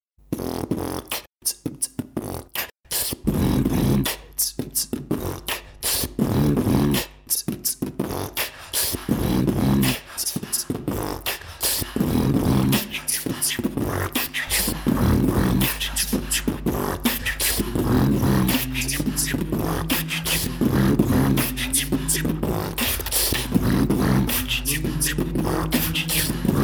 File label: Beat